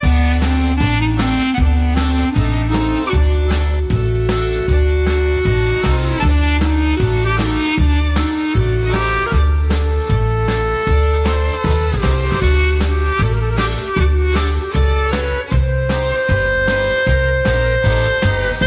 Joy of Klezmer

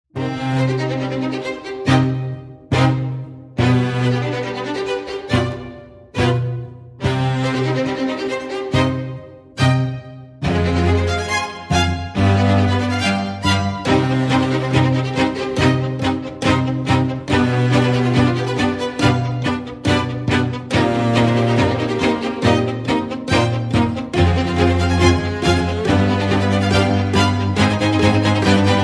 Chamber

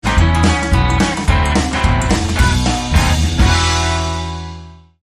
Swing

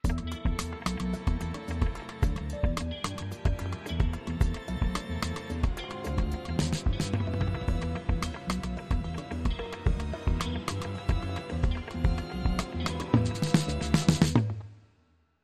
Funky Strings Tension

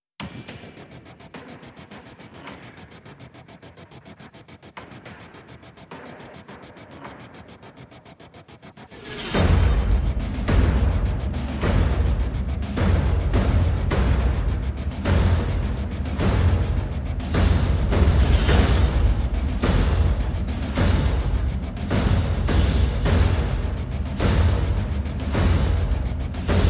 Percussion and Pulses

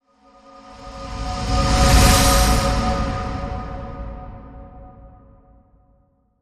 Celestial Radiant Whoosh

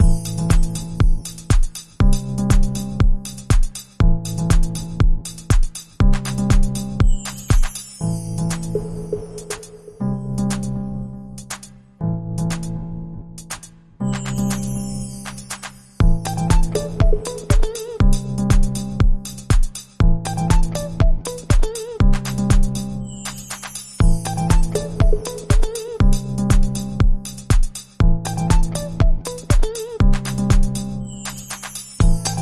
Guitar

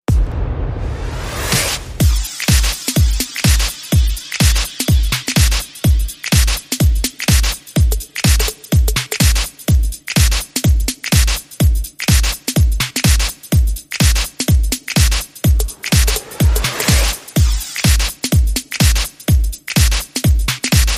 Organ Ice Beat

